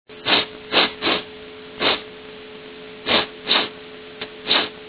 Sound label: sniff